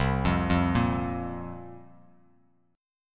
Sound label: C 7th Suspended 4th, Bass Standard; C7sus4 arpeggiated